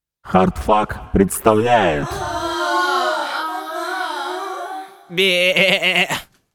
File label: jingle; Fallout 2014